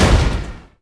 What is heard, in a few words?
Gunship Hurt; Civ4 warlords